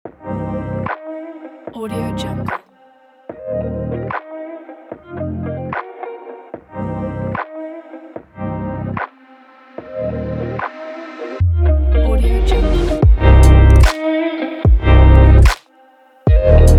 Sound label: fashion stylish rocks beat